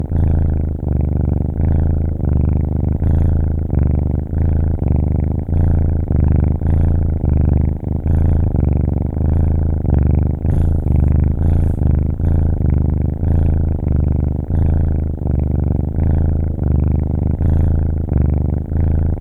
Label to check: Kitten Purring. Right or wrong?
Right